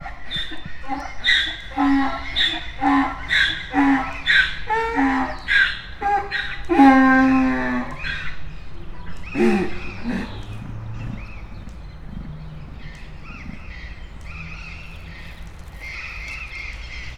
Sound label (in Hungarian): sziklapapagájok is, magyar parlagi szamár; debreceni zoo 2012